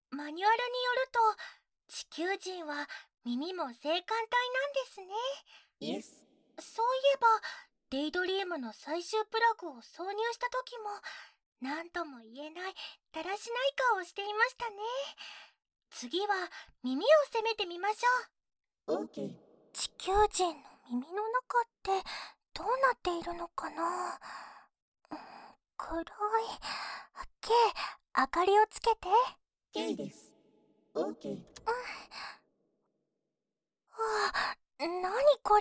ノンストップ機械イラマ♪ 聞くだけで気持ち良くなれる麻酔サウンドで、壮絶嘔吐きアクメ; deep throat adaptor; 喘ぎ声OFF

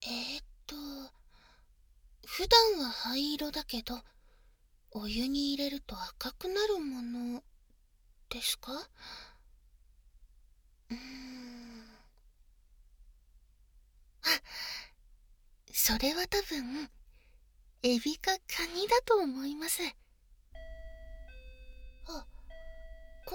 耳かきの実験だそうです, ASMR

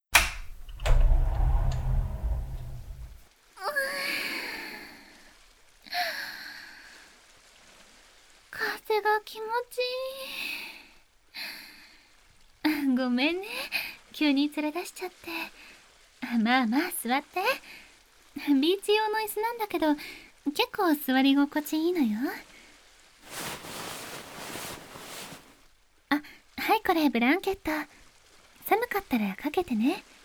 波音と耳マッサージ、耳かき, ASMR

